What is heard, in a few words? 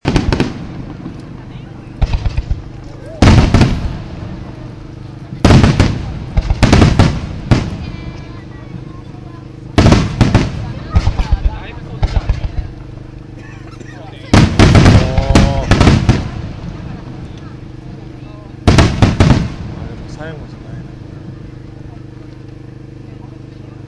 fire ball